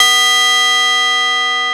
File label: JX CHIME B2; E-MU Formula 4000 Series Vol. 3; Analog Odyssey